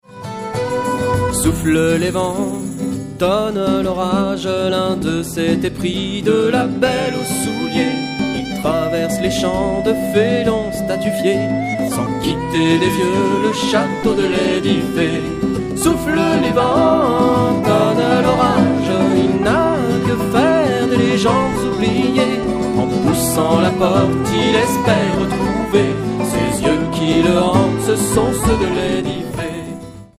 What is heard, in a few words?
pipe